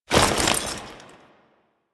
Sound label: Foley Weapon Swap; Warframe; Kahl